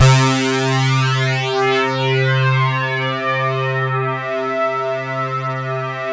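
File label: synth Fat C3; Best Service Gigapack 1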